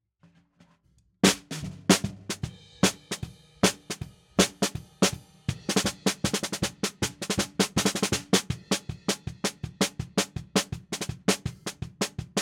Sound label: SNR BTM